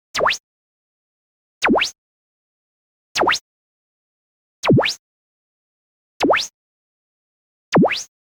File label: MOVEMENT FAST White Noise Twinkle; boom library; Anime Essentials; DSGNMisc